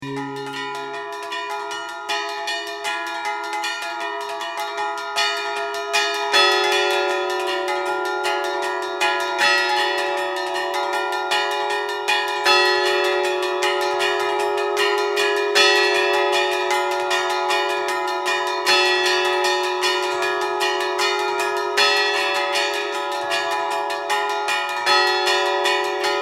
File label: Bells; Christ Is Born